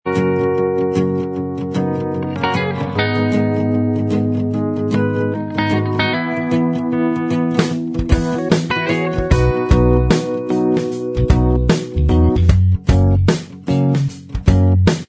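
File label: instrumental, track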